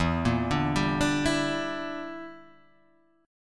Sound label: G Minor 6th E